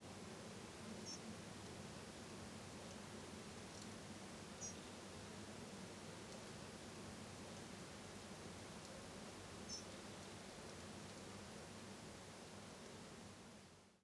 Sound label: two ind NFC